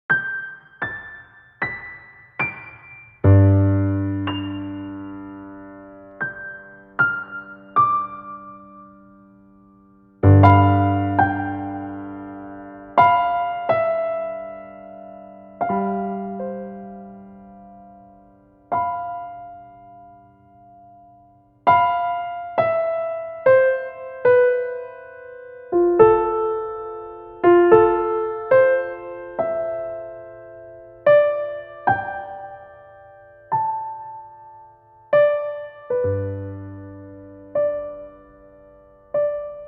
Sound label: Piano Right Side; Classique